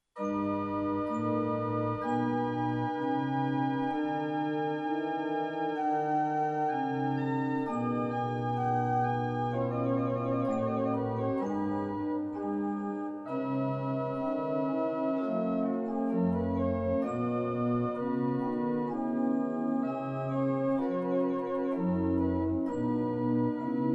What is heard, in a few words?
Adagio Sonate Flute; BachJS BWV 1033; orgue